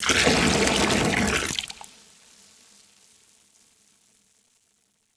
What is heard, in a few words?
Liquid Water Pouring Into Water In Plastic Bucket Fast Heavy